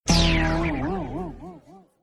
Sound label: whoops